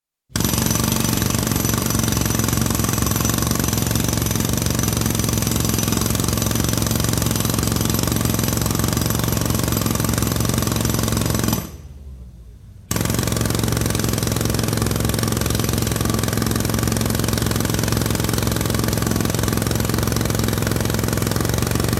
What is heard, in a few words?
Pneumatic drill used on tarmac; Drills; Tools; BBC